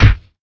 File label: Headbutt; LOOPERS